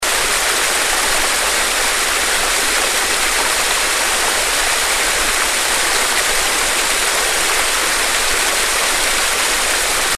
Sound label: Ayers Gap Upper, waterfalls